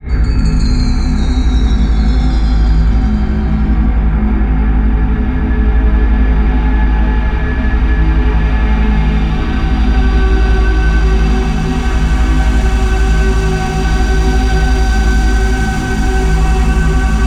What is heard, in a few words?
Exoplanet Alien Soundscapes and Sound Effects; Bluezone